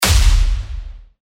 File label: Punch Hit Punchline; Aftermath